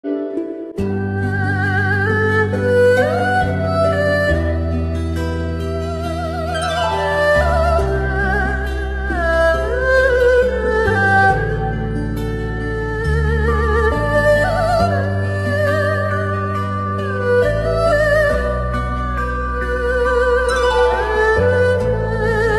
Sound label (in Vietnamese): Sáo Trúc Đàn Tranh Nhạc Hoa Không Lời